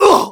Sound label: Mech Damage